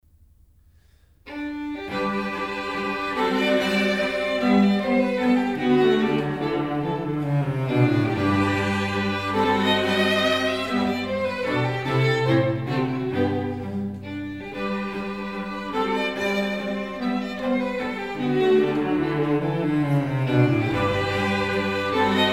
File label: Quartet in G, String Quartet; Op. 54 No. 1; Haydn